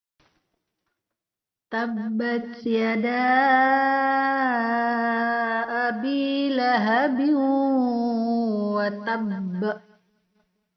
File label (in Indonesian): Perekaman baru Karaoke denoised